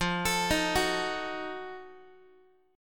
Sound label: F 7th Flat 9th Guitar, chord; arpeggiated; F7b9; x,13,12,13,10,x; JGuitar